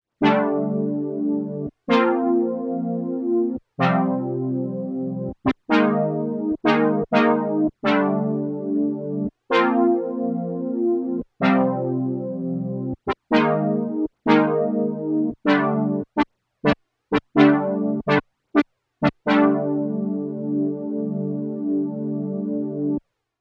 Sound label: Synth Brass